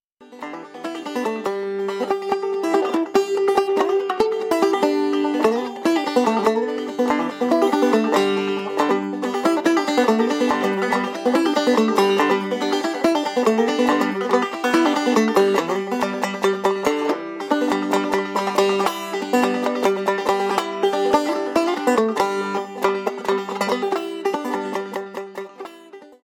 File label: cacklin hen, banjo